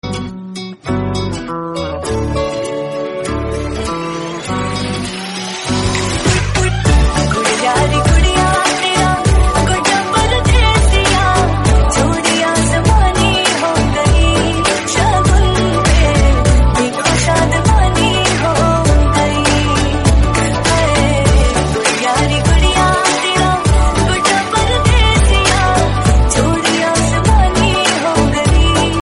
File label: hindi instrumental background music tone ringtone; bollywood